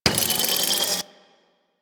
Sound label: Heavy Grenade Launcher Reload; Warframe; Archwing